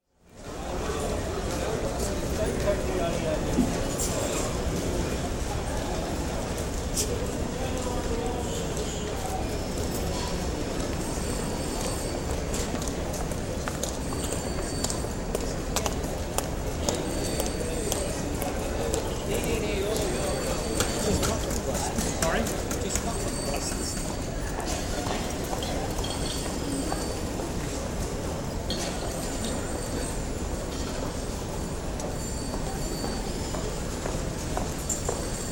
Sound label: Airport Terminal Building, with footsteps, voices, suitcase conveyors & PA announcements; Airport Sound Effects; Heathrow; BBC